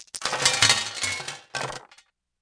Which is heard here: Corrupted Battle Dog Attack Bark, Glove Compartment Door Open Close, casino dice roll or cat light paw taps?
casino dice roll